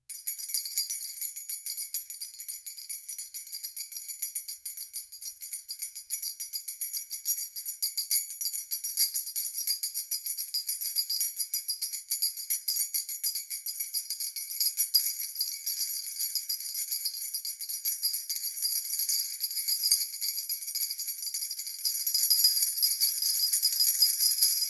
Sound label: jingle bells being shaken